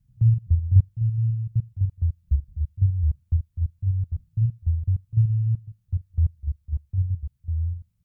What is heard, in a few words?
bass whistle down four octaves